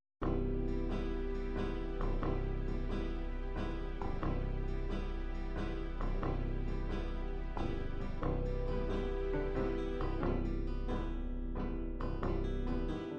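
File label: psr9000 slow waltz, midi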